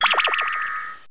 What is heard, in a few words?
Book out; Bible Fun Pack; Education Publishing Concepts